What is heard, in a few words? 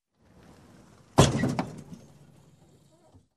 Car Bump Sound